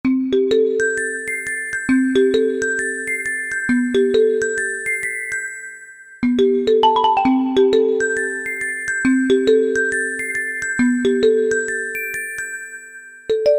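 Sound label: Marimba Glockenspiel, Korg MSC-1S; Korg MSC-1S Variety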